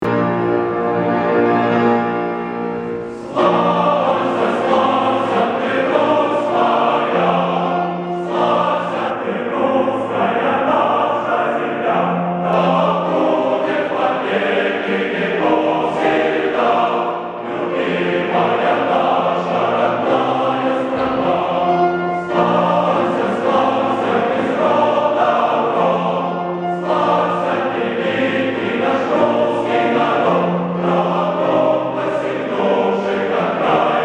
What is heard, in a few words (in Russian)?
славься, choir